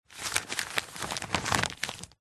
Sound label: household pamphlet large paper handling lightly crumple; zapsplat